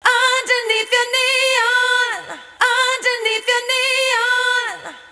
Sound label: Neon Comb